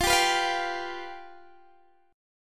F Suspended 2nd Flat 5th, Guitar, Open D

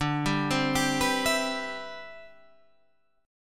C Major 7th, D, guitar chord; Cmaj7/D arpeggiated; JGuitar

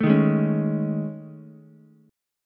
A Major 7th Flat 5th, Ukulele; AM7b5 strummed; 2,3,4,4; JGuitar

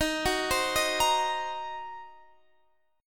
F sharp 6th Flat 5th, chord; x,18,16,17,16,18

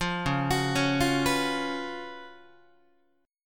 Db 11th, Guitar; arpeggiated; tuning=4A,4E,5A,5D,5G#,6C#; 8,9,9,11,9,10

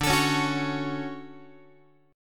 D Major 7th, Guitar; strummed; JGuitar

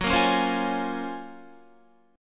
Gb Major Sharp 11th, Guitar; GbM#11 strummed

